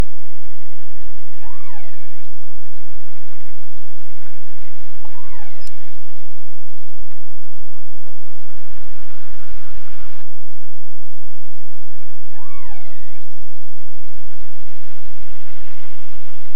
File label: Orca calls from profiler